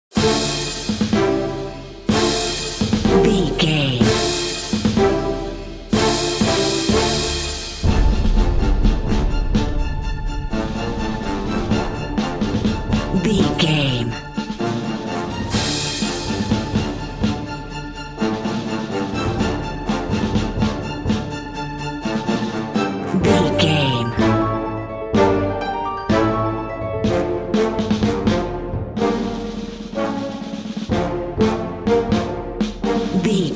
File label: time running out